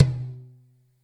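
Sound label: TOM soft; Zero-G; Chemical Beats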